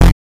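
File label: Saw Lead Lower F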